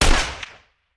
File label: Rifle; Sound Ideas; Guns